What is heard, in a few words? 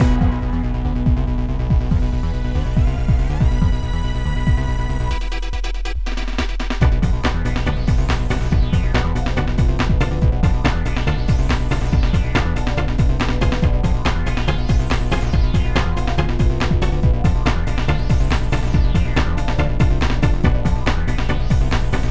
Boss BGM; Sengeki Striker; Kaneko